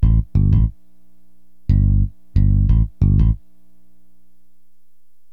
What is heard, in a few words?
BASS, HipHop; LiveAct Pool